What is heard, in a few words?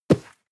cardboard box medium grab slide hard; objekte